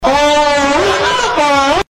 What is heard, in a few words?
Ahh